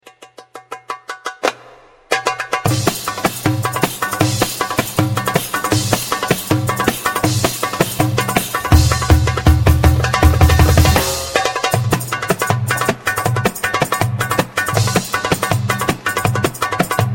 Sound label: drum solo